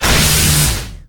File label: Shock, Tesla; scpcb-godot